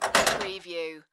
Billiard, Pool, Snooker - Ball delivery mechanism in table